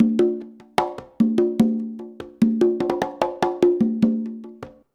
CONGA, Modern Percussion Loops

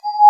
Sine Shaker; Mo' Phatt; Digital Sound Factory Mo' Phatt

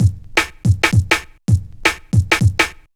Remit 162, breaks; minihalfs

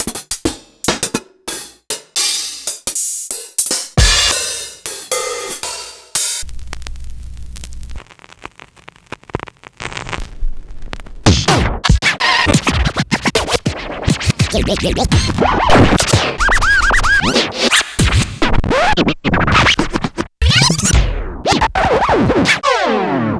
Cuts & Hats; proteusvx

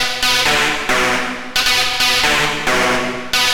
Lead; Sonic Foundry (Sony Creative Software); Afterhours EDM; Session 10 - Come In Hard

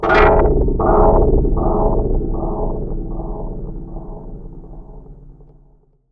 Moog LPF & Delay, contact mic, Wire & Tin

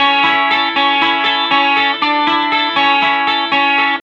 BYRDY RIF 1 A, Vintage Blues Guitar, 120; USB Soundscan vol.22; AKAI

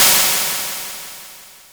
NOIS WAV, INST WAVES

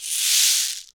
SHEKE, Afro Shakers, Shekeres

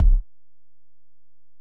Ac BD, Alesis DM-PRO; 300 Drum Machines

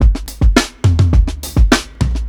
105 TOM BEAT, DRUMS LOOPS; BASEMENT DRUMS VOL 2

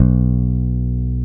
Basses, Roland

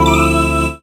Synth Lick; Zero-G Jungle Warfare 1, 2 & 3; 90 s Sample CDs

